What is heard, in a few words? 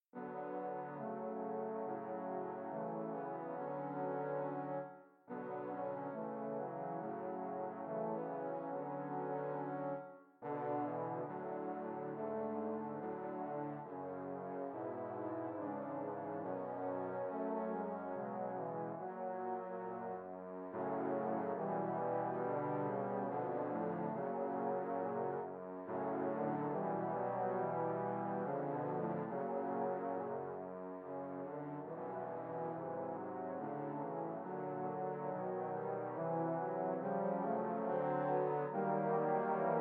minuet trombone quartet; ravel